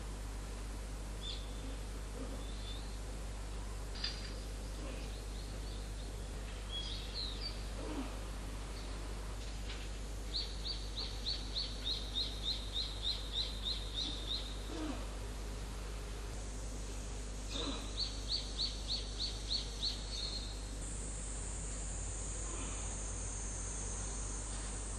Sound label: car washing